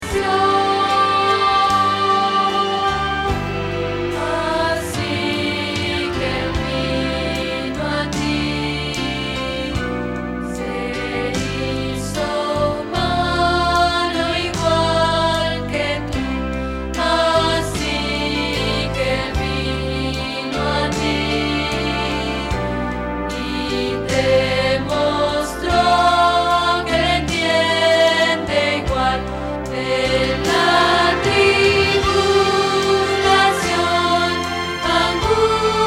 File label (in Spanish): Contralto